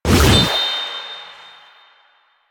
Jade Scythe Whoosh; Weapons; Stalker; Warframe

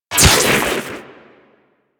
Microwave Pistol Shot; Grineer

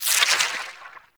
Mushroom Squish, Root Cave; Ambience; Props; Neathuns Root Cave

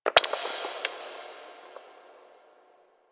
Dust Fall Small; Warframe; Ambience; Orokin Moon

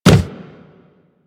Run Wood, Footsteps; Warframe; Operator

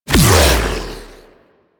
Regurgitate Impact; DE; Warframe; Warframes; Grendel

